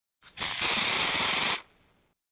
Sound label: Air blow at dentist